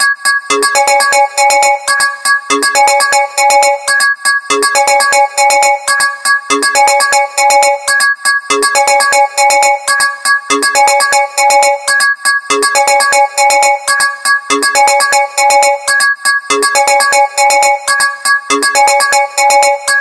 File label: Very Alarmed, ringtones; LG